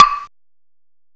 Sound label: CLAVES; PERCUSN; M8; CMI Factory